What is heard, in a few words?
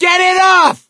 scream while pounced; voice; source engine; left 4 dead 2; survivor; gambler